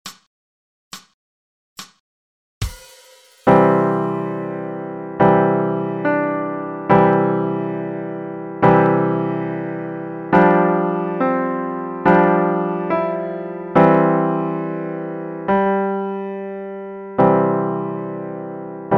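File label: 70, Pianoforte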